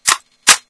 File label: smg world reload, weapons; tf